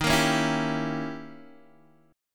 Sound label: Eb 7th Flat 9th, Eb, Guitar, Open G; x,8,8,6,5,5